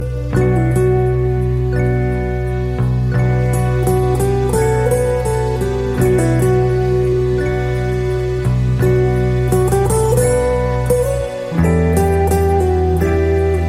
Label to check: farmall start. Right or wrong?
Wrong